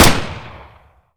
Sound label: ppk fire to empty; zps; Weapons; Firearms